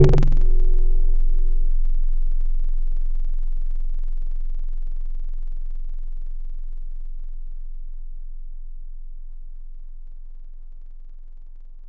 Keys Kalimba; Synthetic's Serum Collection; ESW X Keys

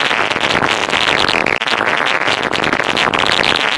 Noise; Synthetic's Serum Collection; Chill Trap 2 Noises

